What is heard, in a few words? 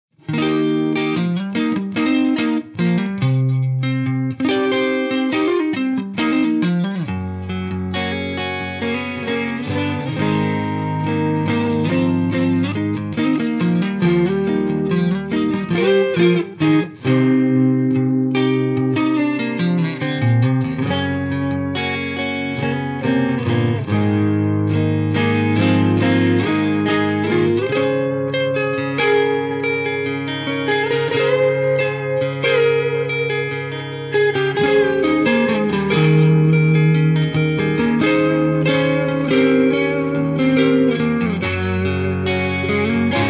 h55 30w strat